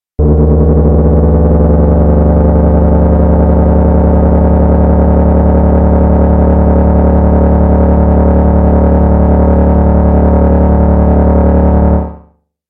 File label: rising plasma